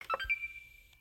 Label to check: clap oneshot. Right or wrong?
Wrong